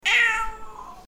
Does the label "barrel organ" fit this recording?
No